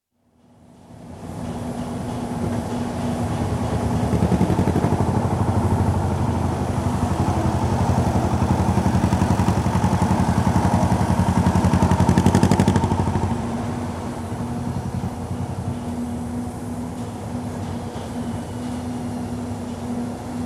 General atmosphere on building site, mid distant acoustic, starts with dumper truck passing; Construction; BBC